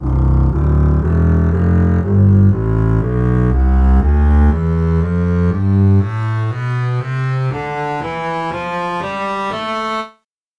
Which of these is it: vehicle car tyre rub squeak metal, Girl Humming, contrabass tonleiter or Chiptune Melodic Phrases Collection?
contrabass tonleiter